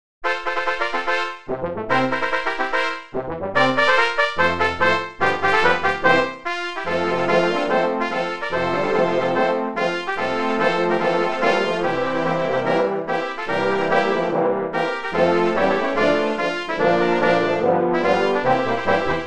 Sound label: SOLO FANFARA; Piume d'Italia - Verona 1866